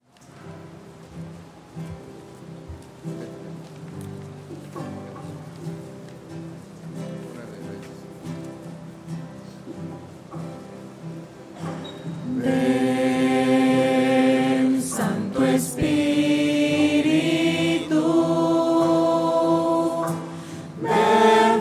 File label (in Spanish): voces; ven santo espiritu; misas 2012